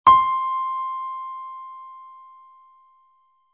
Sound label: piano C; instrument